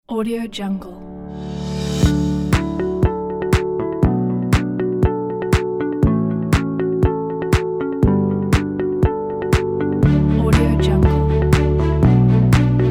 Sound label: inspiring corporate music